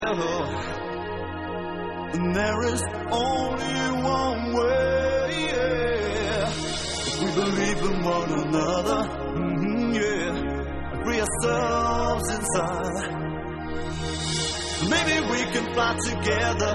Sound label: Vocals Version